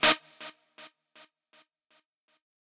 lead

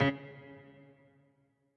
piano riff intro

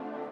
lead

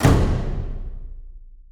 fx cinema

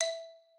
xylo

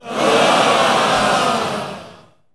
SE GAME BREAK; maimai

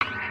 breakbeat

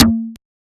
snare; 黄金拼图

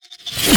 blast

